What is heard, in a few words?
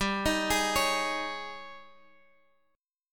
G sharp Major 7th Suspended 4th, G